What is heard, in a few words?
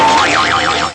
boing